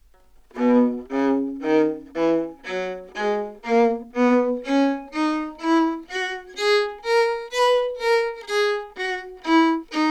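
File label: baroque viola scale detache, Anechoic; OPENAIR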